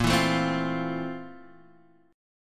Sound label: F Major 7th Bb, chord; Fmaj7/Bb strummed; JGuitar